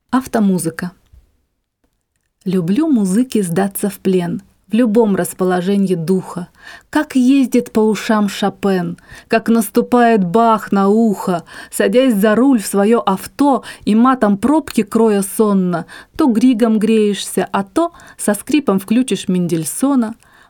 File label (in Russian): avtomuzyka